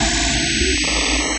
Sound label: sfx sci fi; Masterbits - Switch FX